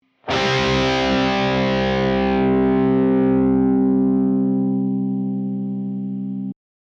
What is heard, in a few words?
Power Chords, guitar